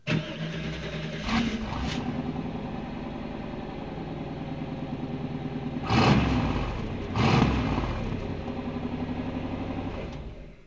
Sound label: before exhaust